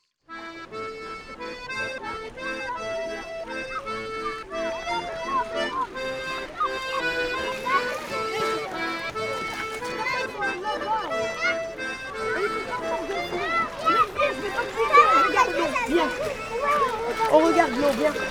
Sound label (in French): Baignade et accordeon lac de Saint Martial; Ambiances Sonores d'Ardeche; Studio Les Trois Becs